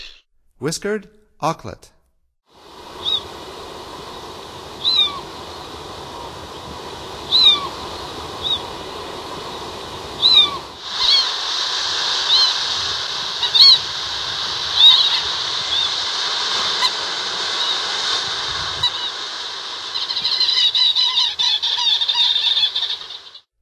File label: Whiskered Auklet; North America; Stokes